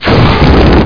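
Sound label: flame start; Hexen II; eidolon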